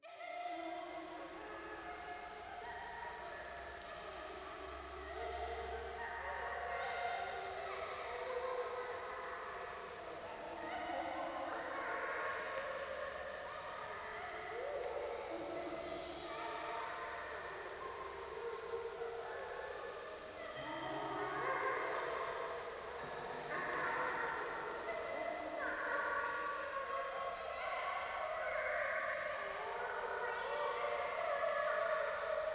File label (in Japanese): 喘ぎ 雨